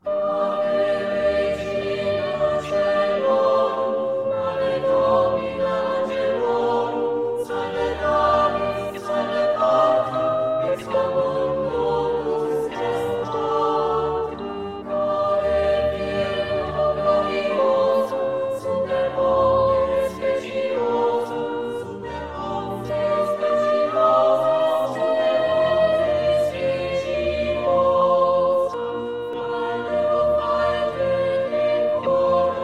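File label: Ave regina coelorum, soprano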